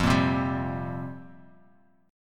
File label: F Suspended 2nd Suspended 4th F down; strummed; JGuitar